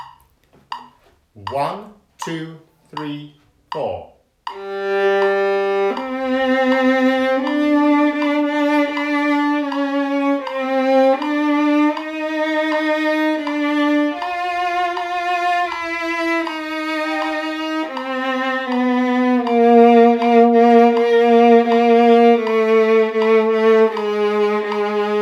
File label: Rainbow Viola